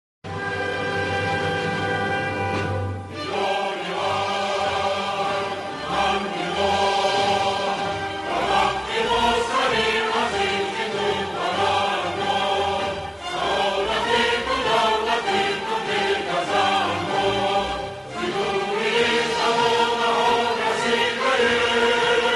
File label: national anthem of tajikistan; surudi milli